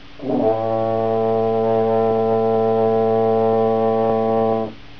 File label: tuba